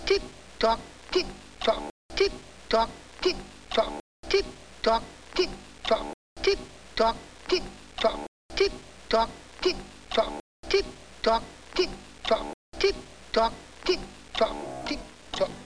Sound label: tic